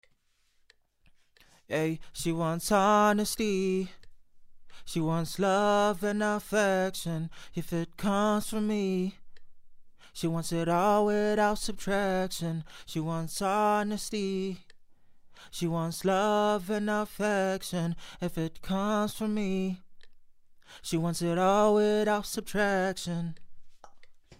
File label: Acapellas pop 90 Ab